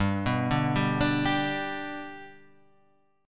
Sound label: G Major G, chord